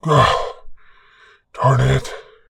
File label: Vulnerable; AudioWizards; Mezafort